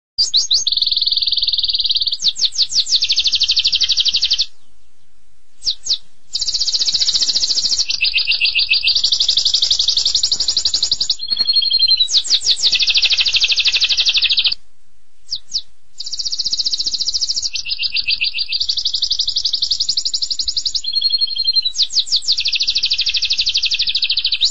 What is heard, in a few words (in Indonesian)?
kenari gacor